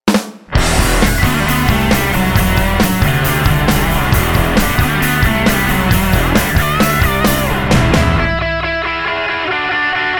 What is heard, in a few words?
No Vocal; Naylor SD 60